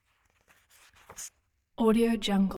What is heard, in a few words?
book turning book pages paper movements